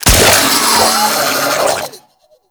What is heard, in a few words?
Infested Shoulder Destroy, Enemies; Warframe; Femur